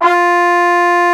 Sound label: French Horns G2